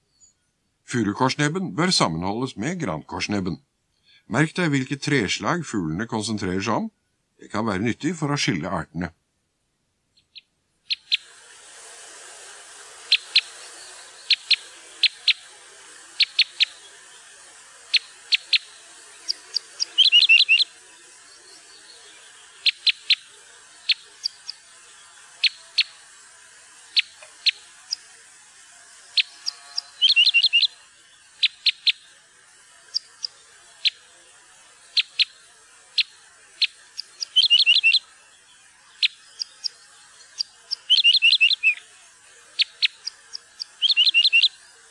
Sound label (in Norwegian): furukorsnebb, fuglelyder